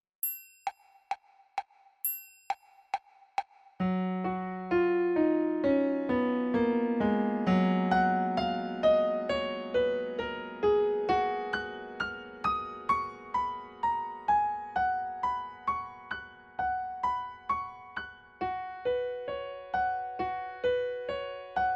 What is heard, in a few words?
Pealing Bells F-sharp